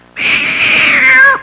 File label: Cat meowing in pain sound